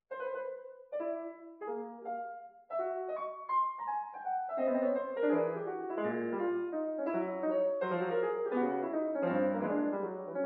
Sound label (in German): Klavierstuecke, Lebhaft